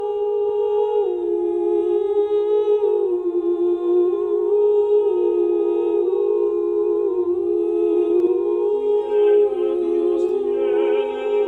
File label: nada te turbe alto